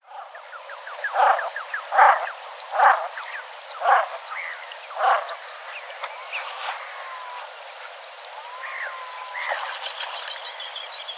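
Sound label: peganka golos, common shelduck voice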